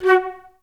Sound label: FL STAC F#3; Best Service; Peter Siedlaczek's Advanced Orchestra